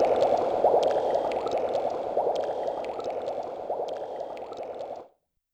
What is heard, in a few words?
Bubble; YAMAHA RM1X